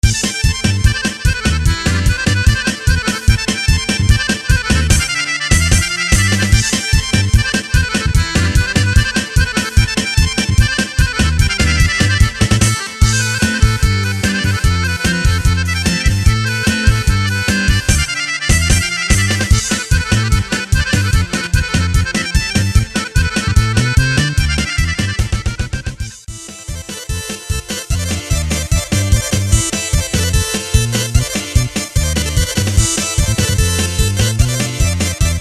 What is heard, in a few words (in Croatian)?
korg pa500 harmonika i terce kolo